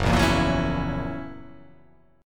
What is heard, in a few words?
F sharp 7th, guitar